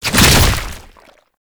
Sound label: Tank Infested Charge Tentacle Whip; Enemies; Abilities; Warframe; Lasrian Tank